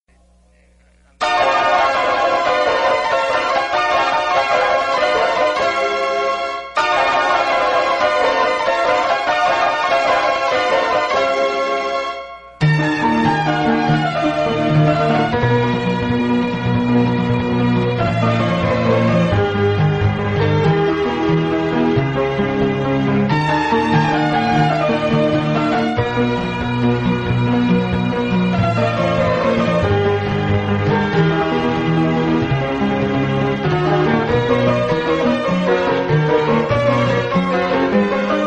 Instrumental

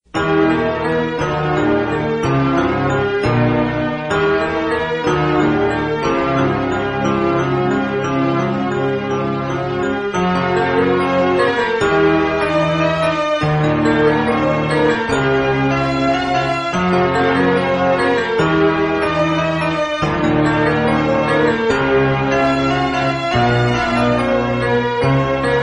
Instrumental

